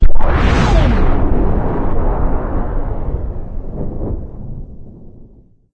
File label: portal beam shoot; ambient; source engine; hl2; citadel